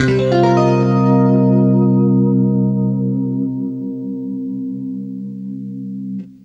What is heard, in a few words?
CLEAN CM9, Guitar; East West; Steve Stevens Guitar Samples Collection